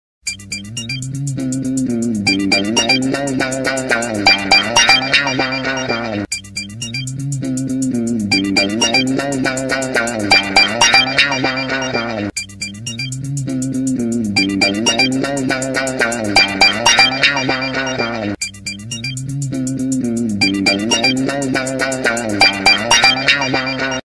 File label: Ringtone For iPhone